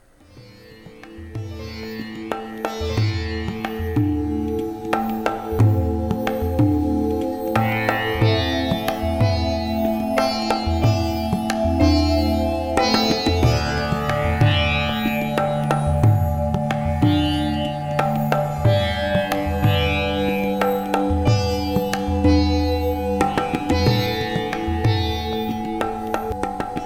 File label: 741hz 14hz; Zen Focus Brain Activation Surya Nama Morphogenic Field Afformations